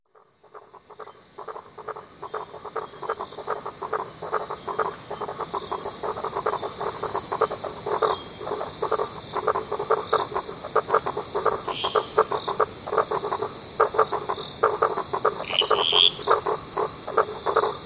echoed frogs and waves; Stereo; soundscapes

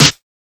crunchy steel snare drum sound d key

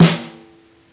roomy steel snare drum sound f key; snare drum samples

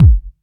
low bass drum g key

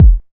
tight bass drum sound f key; kick drum samples

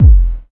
tight lows steel kick drum f sharp key

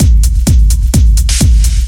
techno big kick and open ride loop; drum loops

